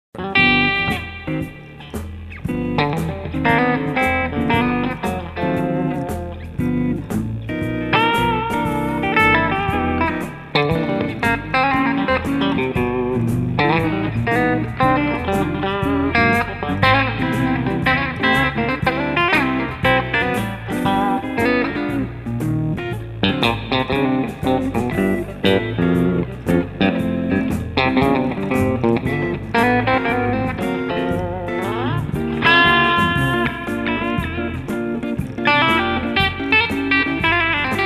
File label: Tele Br